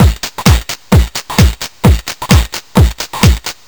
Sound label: Crushed Beat 130BPM; musicradar